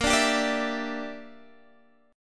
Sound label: Bb 6th Flat 5th, Guitar, Half Step Down